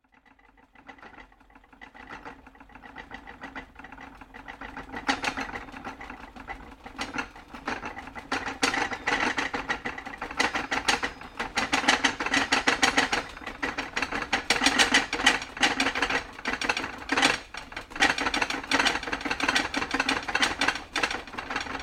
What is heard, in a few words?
household kitchen shelf crockery glassware shake rattle earthquake